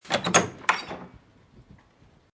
Door Open, Old brass doorknob turns as a door is quickly opened.; Miscellaneous Doors